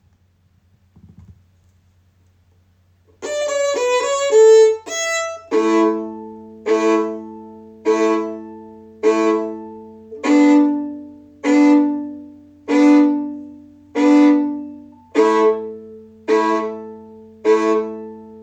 Devils Dream Chords

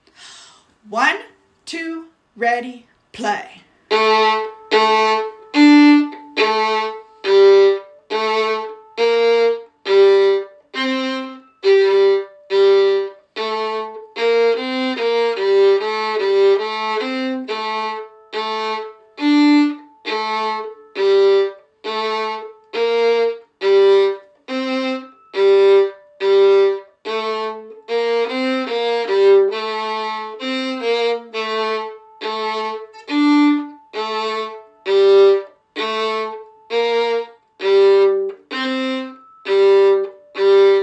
Violin

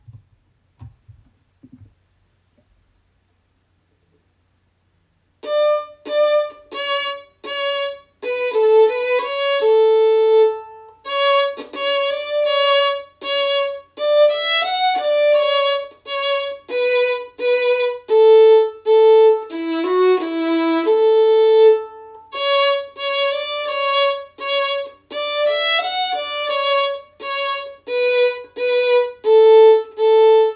Allegro Harmony

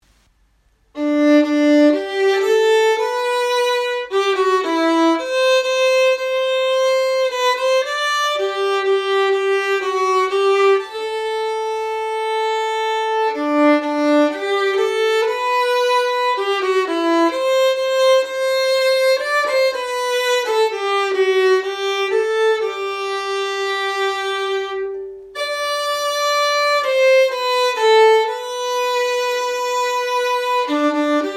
Home on the range Key of G

